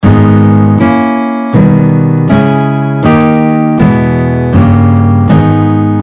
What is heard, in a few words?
G Sharp harmonic minor chord scale, guitar